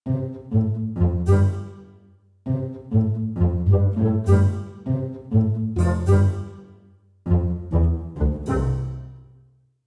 Comic

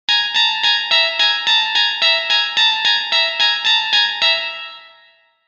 guitars; Twilight zone

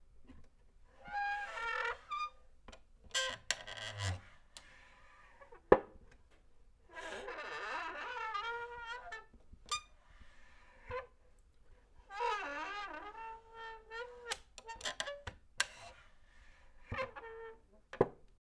Creaking Two Step Wooden Stool

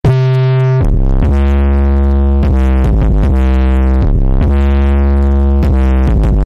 Heavy Bass 808 Loops

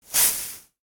Hit Bush

